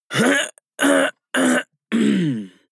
harrumph male hard long; koerpergeraeusche; menschen; soundpool

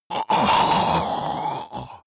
monster choking zombie dying; koerpergeraeusche; comedy-cartoon; soundpool

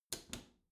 bathroom cabinet close soft slow; objekte; tueren

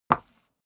step leather shoes wood parquet slide short; schritte

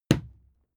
fight impact sweetener cloth; nahkampf; soundpool

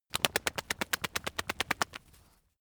dog shake ears flap; natur-tiere; hunde

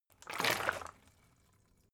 bottle water big upend; berufe

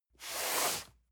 cardboard box medium slide; objekte

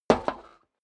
step boots metal dry single left; schritte

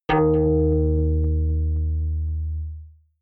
synth hit drop echo; whooshes rises

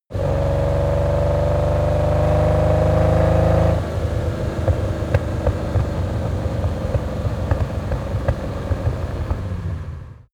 car lamborghini countach small accelerate clatter

